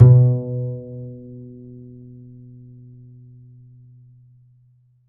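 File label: double bass B2; Univers Sons; Jazzistic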